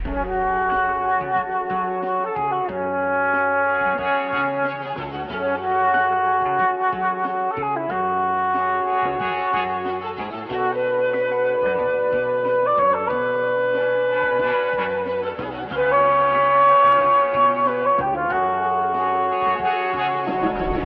flute needle in my eye, Loops, Jazzmatazz

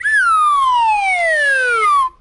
whistle down

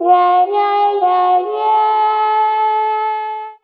fail horn

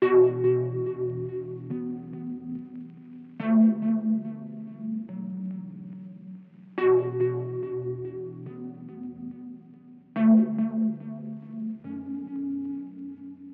keys

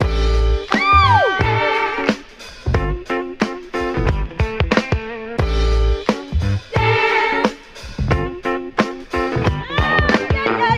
full, Loops Phonky

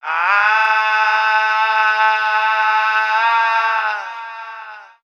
voice agony; Sad

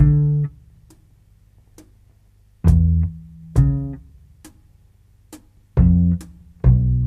bass, Loops

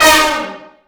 Horns

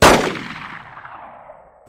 gun shot; Crash Bang Boom

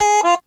two harmonica notes, Stabs

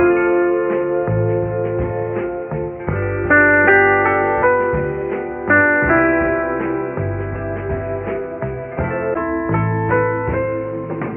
piano; Phonky

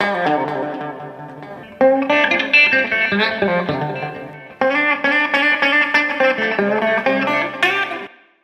guitar noodles and freestyles, Loops; Phonky

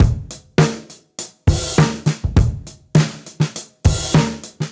Drum loops, Boombap

